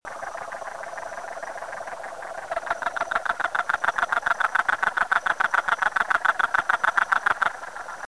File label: Peltophryne lemur Guanica State Forest Puerto Rico; Its call resembles a hen's clucks, and a distant chorus of them truly sounds like a chicken roost; West Indian